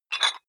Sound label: dumbbell pull up rattle soft, fitnessstudio